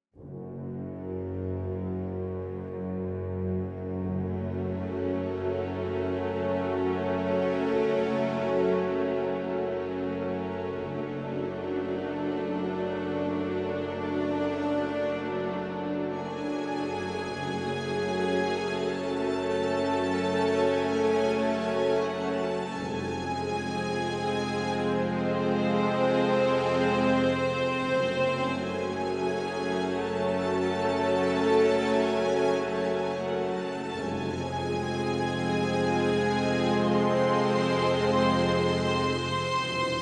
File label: String Test